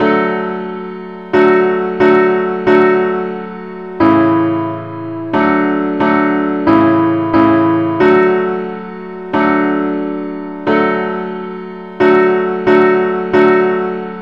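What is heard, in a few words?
simple accompaniment key d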